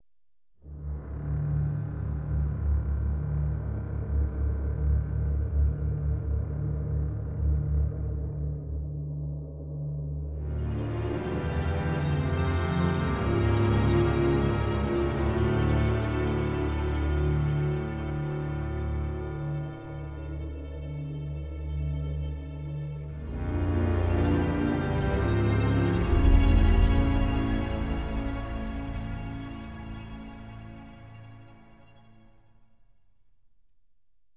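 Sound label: Music INVASION INTRO SPOOKY; IACON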